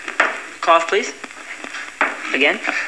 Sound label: cough; Race